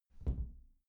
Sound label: glass door close soft